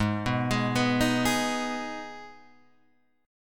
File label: Ab Minor Ab down Guitar Open G